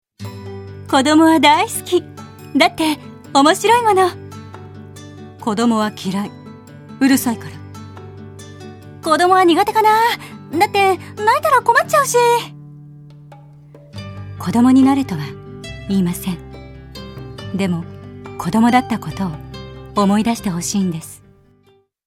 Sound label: kids